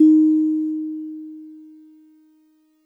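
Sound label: DreamLike DX100 D sharp 3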